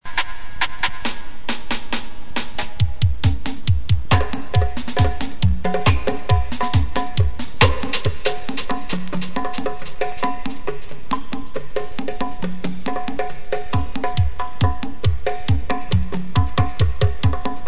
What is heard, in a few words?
drums